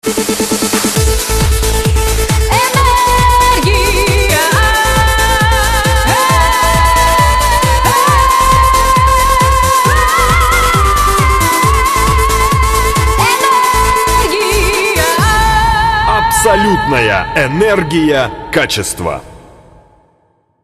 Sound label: energia jingle